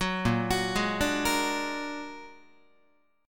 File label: Bb Augmented 7th Bb down Guitar 4A,4E,5A,5D,5G sharp,6C sharp; Bb+7; arpeggiated; JGuitar